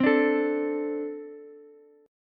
A Minor Double Flat 5th, Ukulele; Ambb5 strummed; x,12,10,12; JGuitar

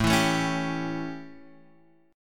A 7th Guitar Drop D, chord

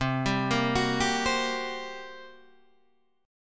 G Minor Major 7th Double Flat 5th, C

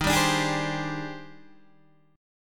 D sharp Major 13th D sharp Guitar DADGAD, chord

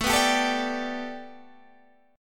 B Minor 7th Sharp 5th A, guitar chord

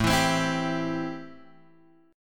A-Minor 7th A Guitar Open G

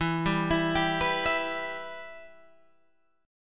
E-Minor, Guitar, Open G

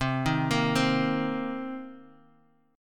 C Minor 7th, Guitar; arpeggiated; Cm7; 8,6,8,5,x,x; 4E,4A,5D,5G,5C,6F

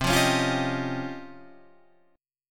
Db Major Sharp 11th C

